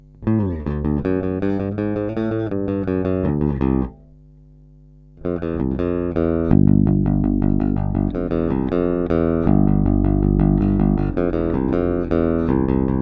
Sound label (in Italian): ponte dita aperto